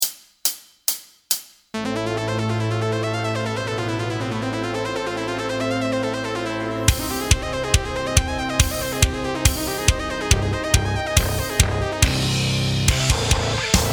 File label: arpeggios backing track